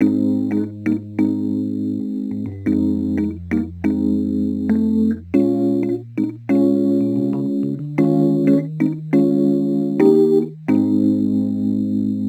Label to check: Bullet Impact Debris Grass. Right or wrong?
Wrong